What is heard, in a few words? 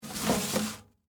Mail Box Insert, Household, Mail, Box, Insert, Mail; Package; Miscellaneous Household Sound Effects; BlastWave